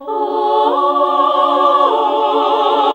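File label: Classical Sing, choir; Best Service Gigapack 1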